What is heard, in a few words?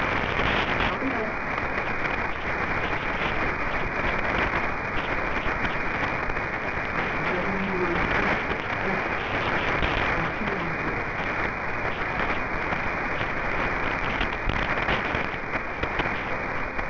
HAARP, 8000 KHz